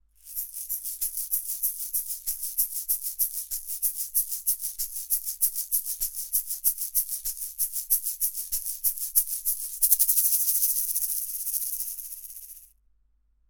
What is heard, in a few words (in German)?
manley reference silver shaker niere flat